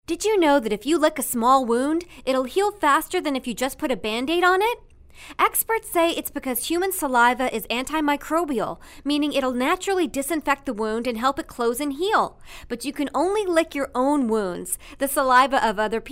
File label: Lick Wound